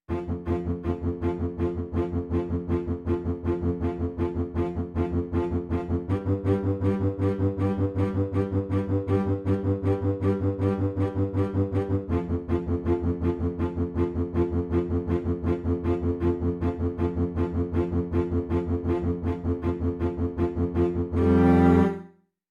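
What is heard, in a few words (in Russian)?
фоновая музыка "быстрый оркестр"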